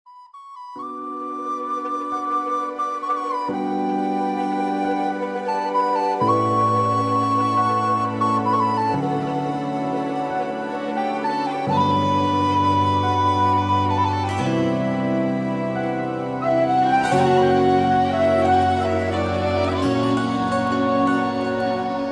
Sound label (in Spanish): violin elegante